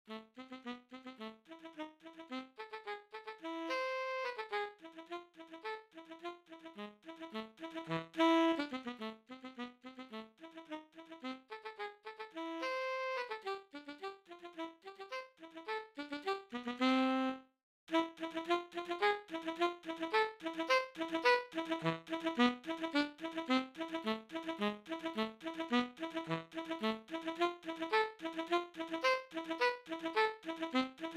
Tenor Saxophone

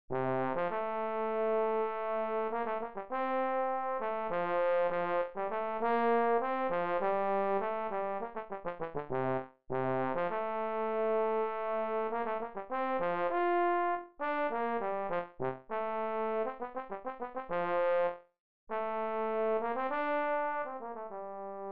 Trombone

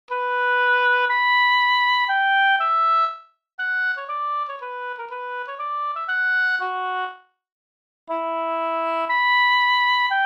Oboe

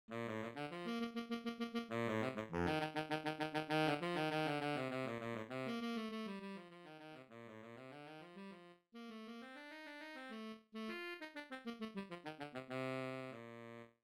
Baritone Saxophone

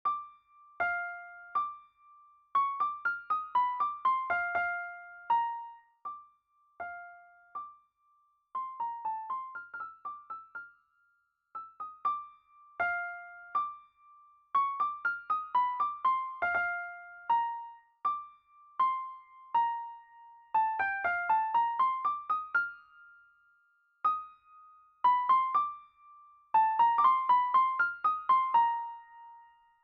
Baritone T.C.

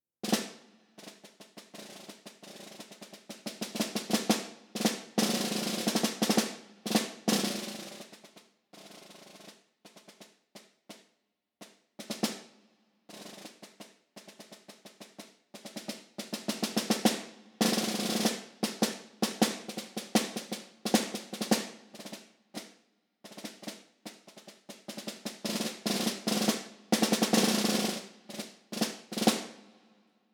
Snare Drum

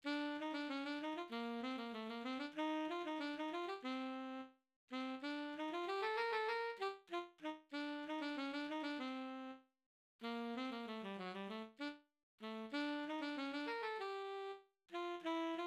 Tenor Saxophone

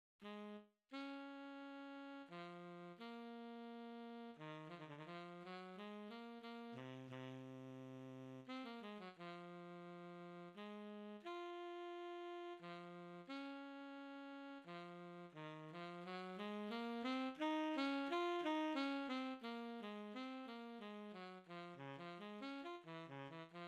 Tenor Saxophone

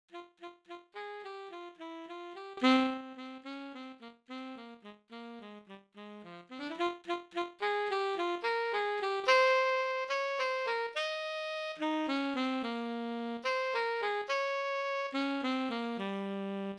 Tenor Saxophone

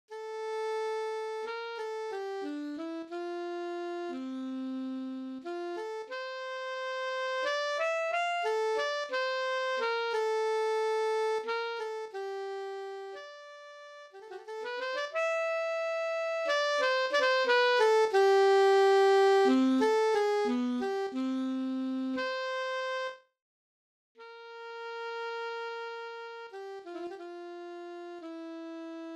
Alto Saxophone

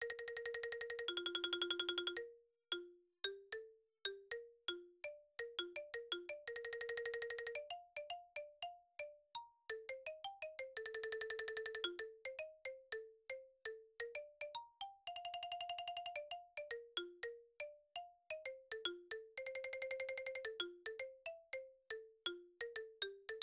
Mallets

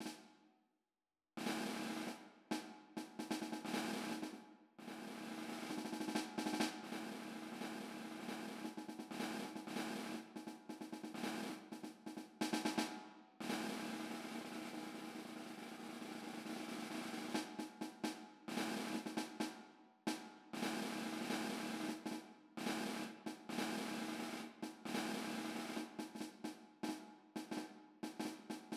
Snare

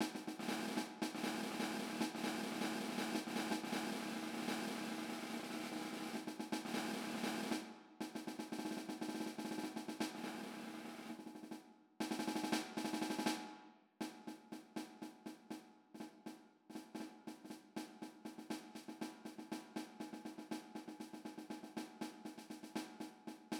Snare

